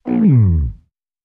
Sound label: Gigantic Monster G